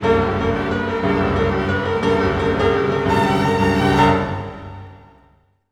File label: Orchestral Loops; 128 MANHATTAN